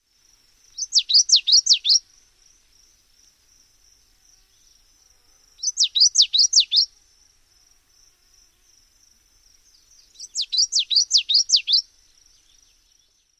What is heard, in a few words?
songs